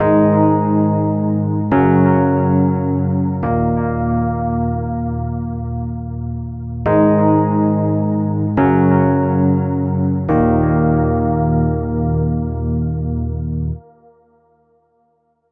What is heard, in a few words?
Rhodes; KJ Sawka; Drum n Bass & Beyond